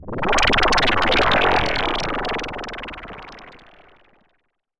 PROPHE FX, SYNTH FX; AKAI S6000 CD-ROM - Volume 6; Snth FX

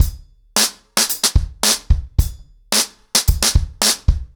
110BPM, ACOUSTIC DRUMS; The Roots Drumloops

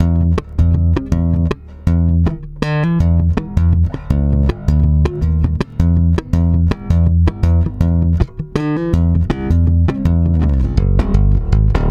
AL STRUM F#, bass; Spectrasonics; Bass Legends; 88 STRUMMIN

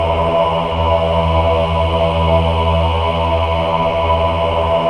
men ah, Choir of Ahs; Roland - LA Composer vol. 1

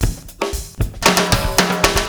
Fonkee Drm Fill 115, Lounge Drumz

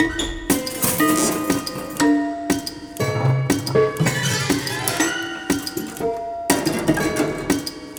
Prepared Piano Loop; Sonic Foundry; Sony Creative Software; The Electronic Music Manuscript Collection

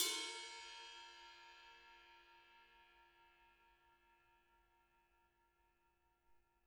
RIDE, RIDE CYMBALS; Spectrasonics - Backbeat

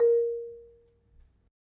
Marimba, A#2; Terkelsen

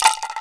RATTLE, INDIAN PERC

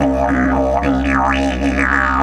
108, Didgeridoo

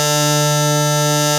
DIGI BASS; AKAI S6000 CD-ROM - Volume 1; SAMPLE WAVE MIXING 1; 128 PRO SYN

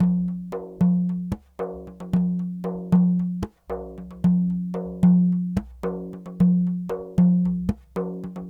Sm Frame, World Percussion

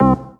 DM ORG1, Dance Mega Synth

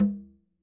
Conga Hit, Latin Percussion, One Shots

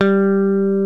LES PAUL

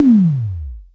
KLONE, KICK, Klone Dual-Percussion-Synthesiser; KLONE KICK NW8; 300 Drum Machines

